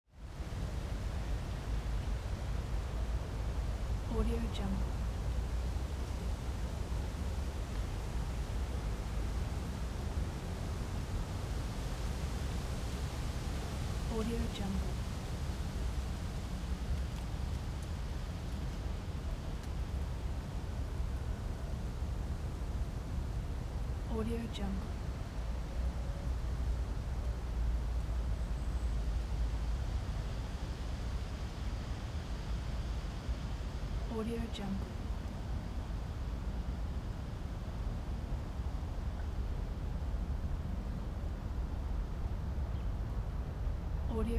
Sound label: autumn wind in suburban forest